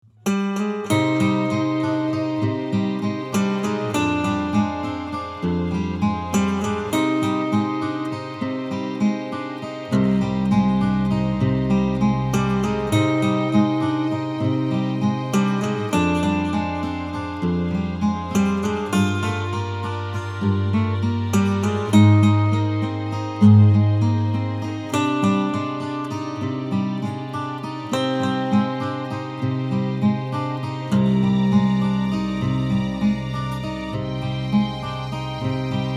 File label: acoustic; Sealed Door; Chrono Trigger